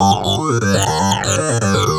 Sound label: Throatline, Industrial; Nasty